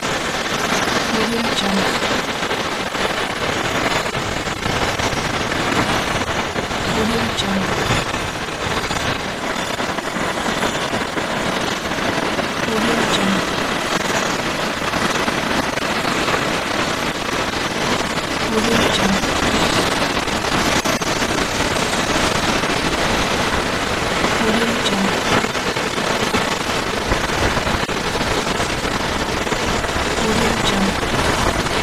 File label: digital interference loop